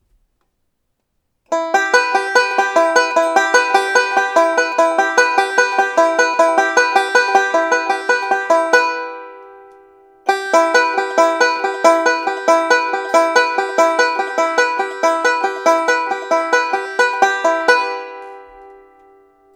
strings be, banjo

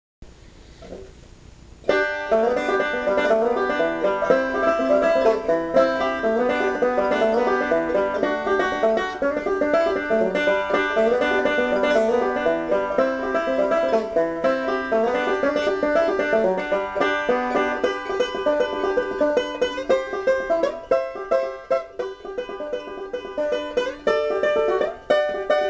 banjo

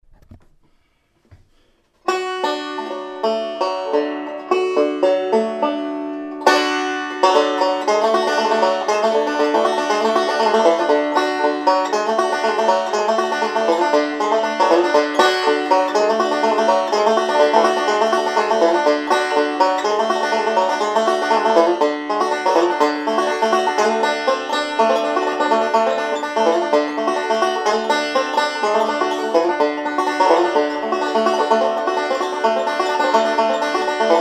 banjo

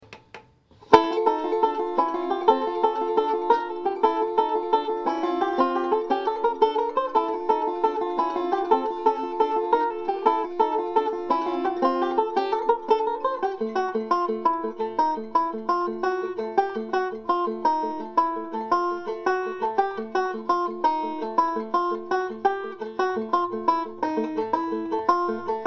blue banjo

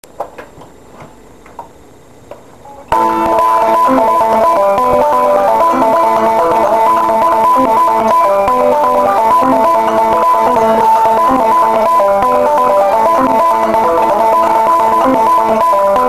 growling, banjo

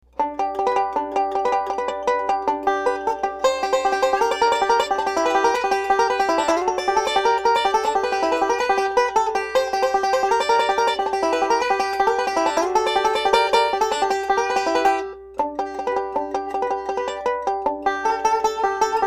bugle call

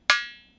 banjo

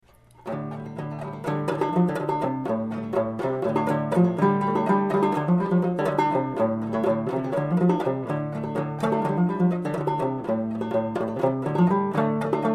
improv, banjo

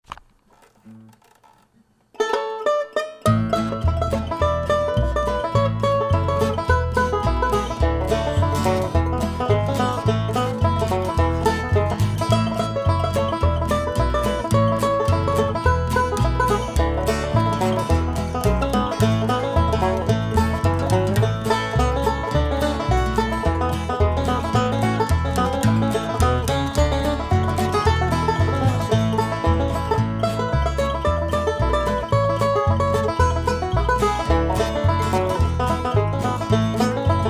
banjo

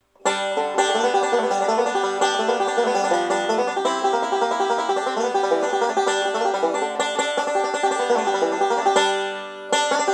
banjo

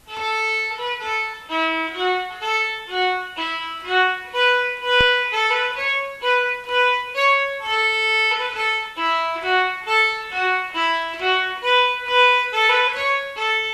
irish polk, fiddle

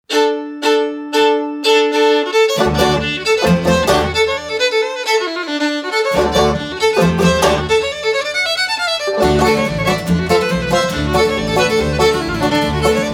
orions jig, fiddle

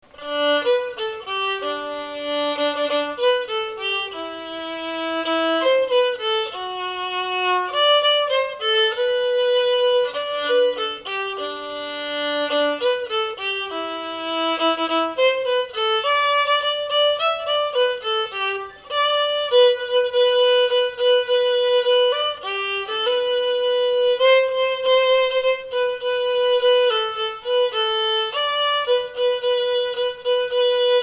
jingle bells, fiddle

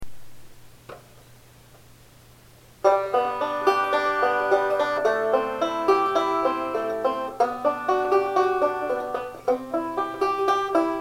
banjo

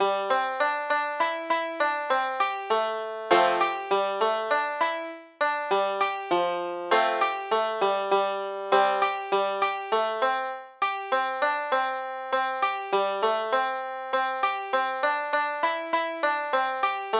tonopah waltz, banjo

